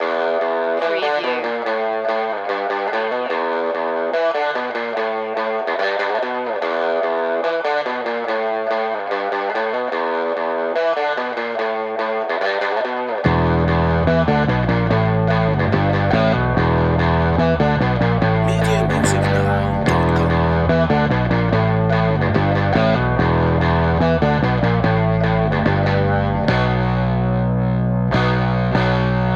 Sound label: rushing no drums